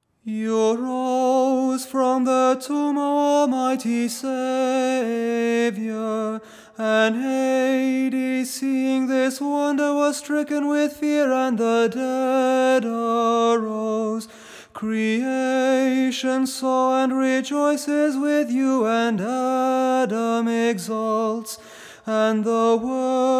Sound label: Tone 6 kontakion